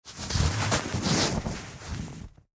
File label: Silk bag manipulation material